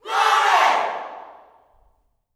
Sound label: "GLORY", Gospel, YELL SHOUT; Spectrasonics; Vocal Planet Vol.1 Gospel; akai